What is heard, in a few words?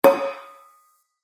Clang; Clang Sound Effects; Impact